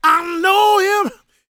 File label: GOSP MALE, Vocal Planet Gospel